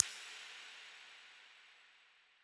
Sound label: spin Button Click; netent